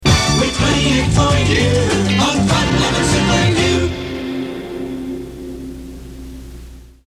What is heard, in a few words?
Jingle; WYOO; FL Super U